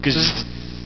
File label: Shock Smallest; john travoltage